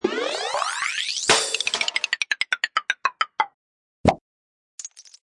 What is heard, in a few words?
Cartoon Multi Fun, Swoosh up, Crash, Ratchet down; Comedy/Cartoon; Bonk and tiny clink; Cartoon Sound Design; Lynne Music